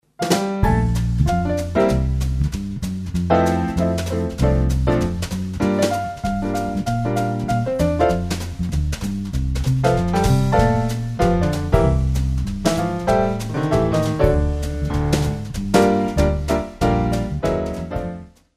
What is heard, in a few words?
Instrumental